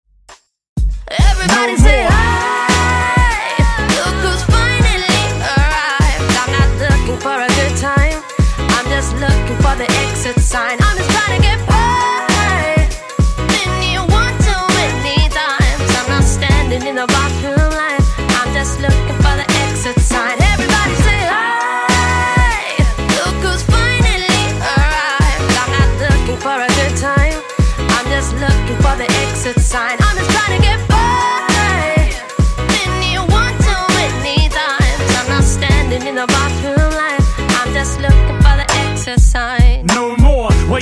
Funk Intro Outro 100 Bpm

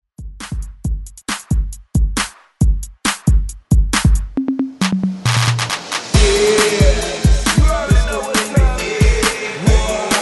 90s Dance Hype ReDrum 136 Bpm

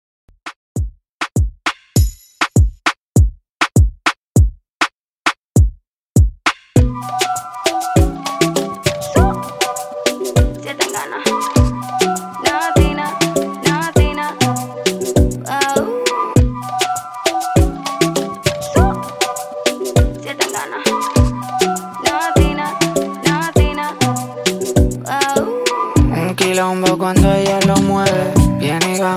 Latin Moombah 100 Bpm Clean

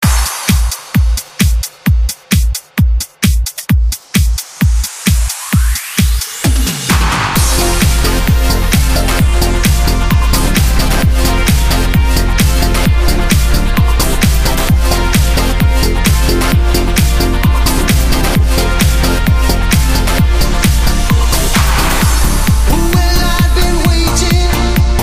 club trance 80s 131 bpm

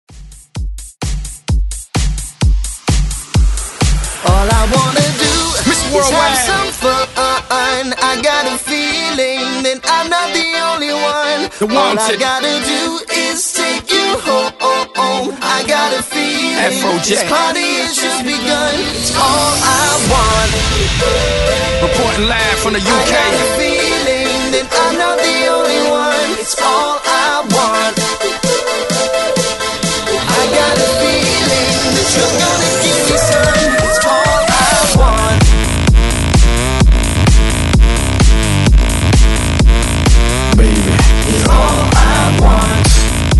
ReDrum 128 Bpm

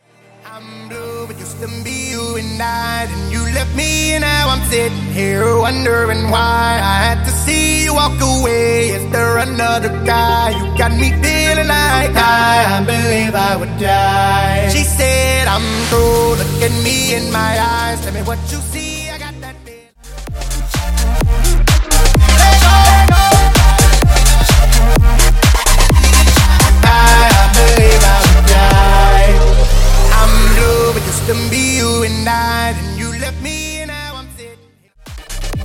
EDM Redrum; Remixfreakz

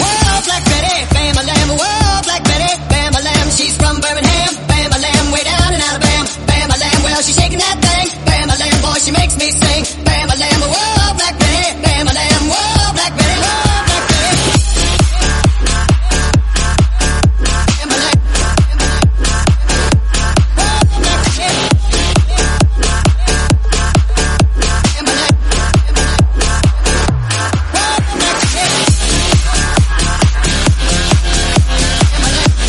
134bpm Dm

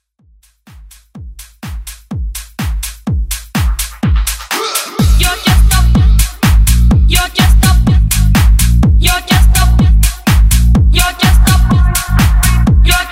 Vocal House

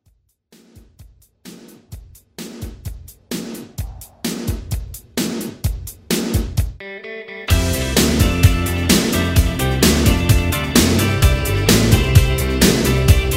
80s Ghost Redrum

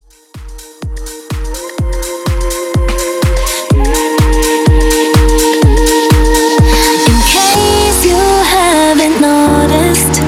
Tropical House 125 BPM

